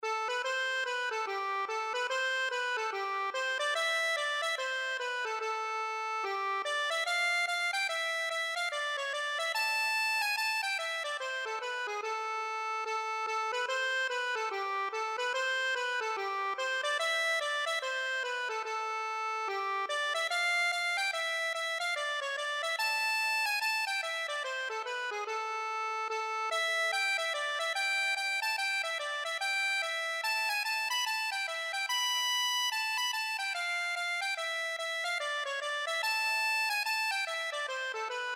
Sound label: o carolan farewell, accordion